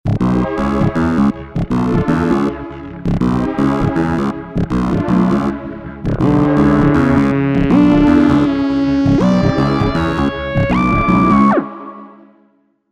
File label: Korg Prologue